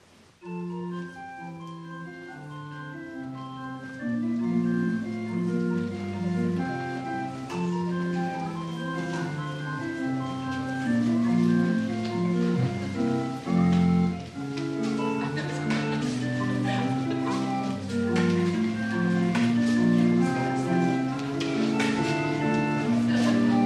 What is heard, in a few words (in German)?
Orgelausgang